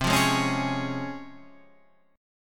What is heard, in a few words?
Db Major C, Db/C chord; strummed; JGuitar